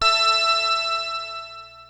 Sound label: PPG A4, synthesizer; Hollywood Edge; Giorgio Moroder Rare Synthesizer Collection; PPG.2.3